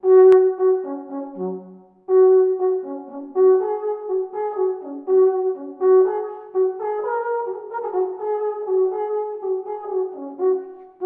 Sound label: Alphorn